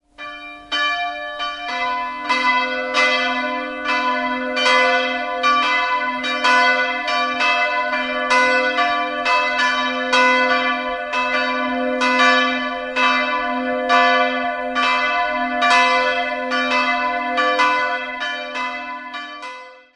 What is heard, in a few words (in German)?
Lippertshofen, Glocken, Filialkirche; St. Georg und St. Leonhard